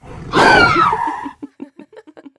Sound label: attack